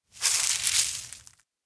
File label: puffed rice crunch; anemoi